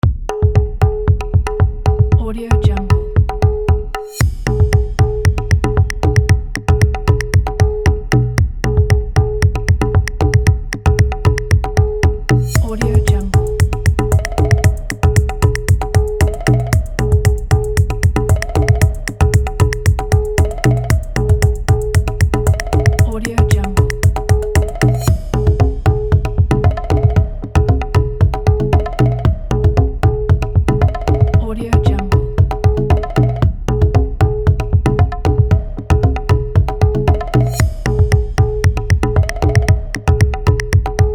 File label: percussive tune